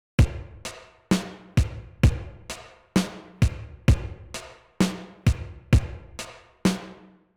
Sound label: Drums Pro R 2 Modern